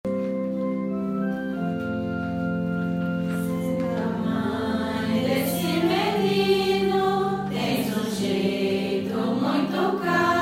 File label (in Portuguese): Canto de Entrada